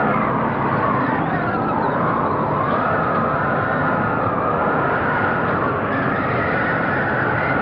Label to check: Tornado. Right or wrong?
Right